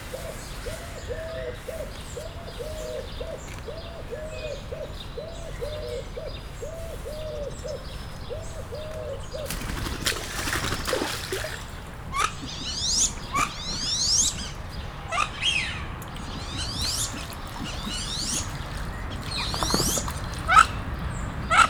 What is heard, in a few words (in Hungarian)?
vízbe ugorva kergetik egymást, mandarinréce; kecskemeti zoo 2016